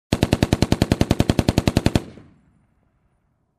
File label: Machine gun fire long